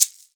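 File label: shaker, percussion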